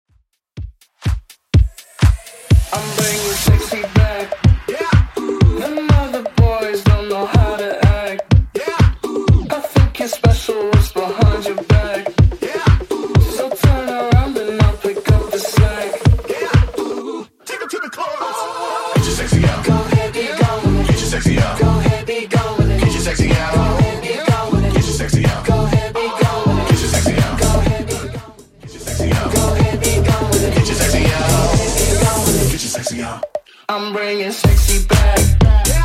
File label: House Redrum 124bpm